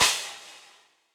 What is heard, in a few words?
Crash Reso Classic, Cymbal; Drums; Drum Hits; Standard Hits; Ableton; Factory Packs; Session Drums Club